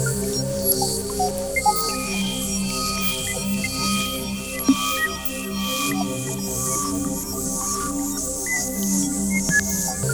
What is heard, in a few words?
ATM ATM, ATMOSP.; Sound & Vision - Gigapack II